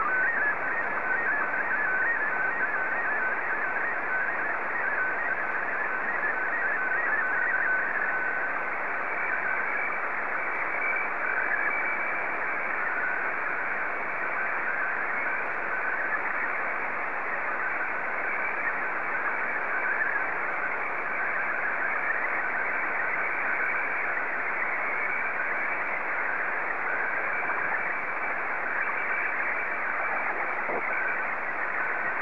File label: sstv